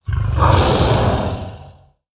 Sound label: Escaped Mana Saber Play